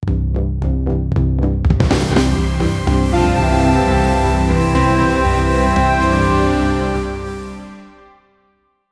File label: intro police crime, introduction